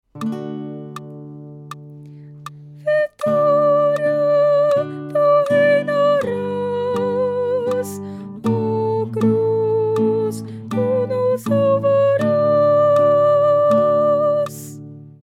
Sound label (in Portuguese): Soprano